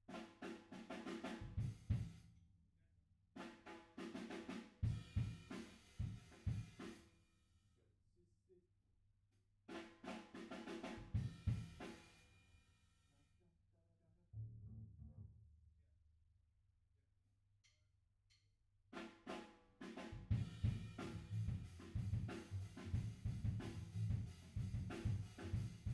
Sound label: Piano B